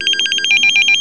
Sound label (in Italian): squillo